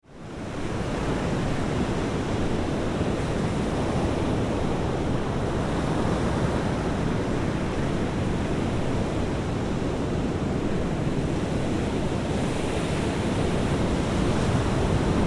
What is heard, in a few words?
Ambience Ocean Surf; Ocean (Sound Effects:Nature)